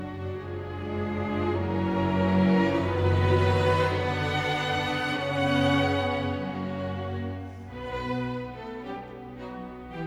Double Bass Concerto No 2 in B Minor I Allegro moderato